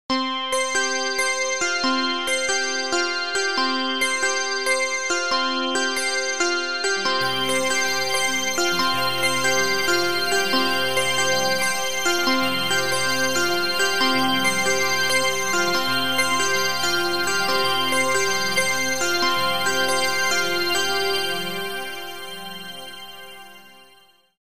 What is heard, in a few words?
magic midi delay